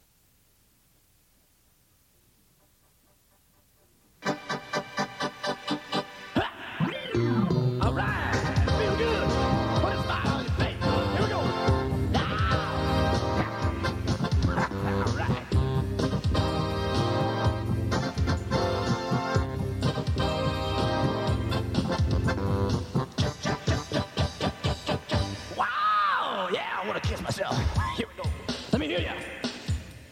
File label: Funk